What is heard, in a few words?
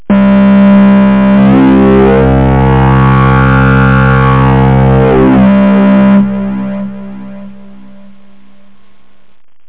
joystick wah